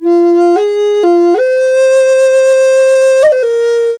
F 120, FLU RIFFS, Didgeridoo and Other Primitive Instruments; Big Fish Audio - Didgeridoo and Other Primitive Instruments